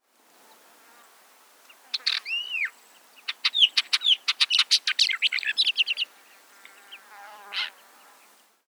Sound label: Capped wheatear